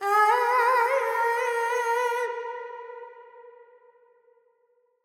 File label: Verb Dorian, Vocals, Carnatic Female, Alaps, 70 G